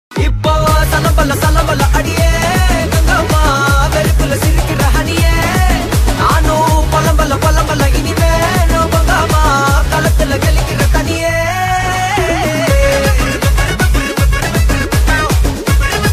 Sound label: Ringtone, song bgm; Madharasi Movie; Sivakarthikeyan